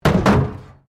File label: METAL BIN IMPACT PLASTIC CRASH BARRIER